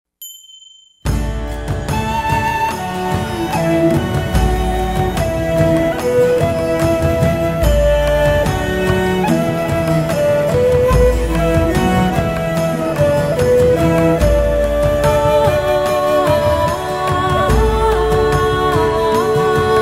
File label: Mantra